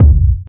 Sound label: warm sounding bass drum sample f key; kick drum samples